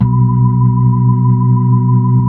B-3, Brite; Keyboards of The 60's and 70's

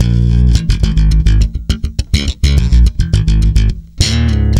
A#, tweaky, bass; Spectrasonics; Bass Legends

